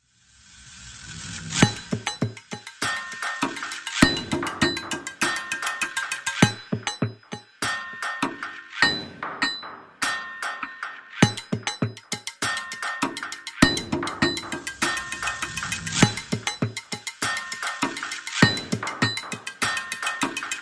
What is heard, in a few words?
Hits Only